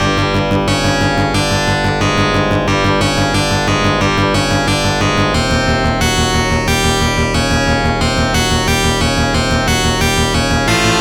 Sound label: arcade stage 5 6; ghosts n goblins; inside great demon kings castle